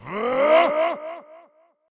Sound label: Barbarian Shout